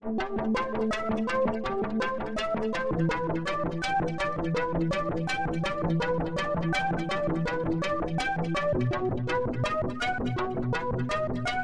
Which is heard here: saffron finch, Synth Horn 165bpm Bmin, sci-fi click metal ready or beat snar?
Synth Horn 165bpm Bmin